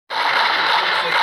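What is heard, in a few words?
shooting; strelba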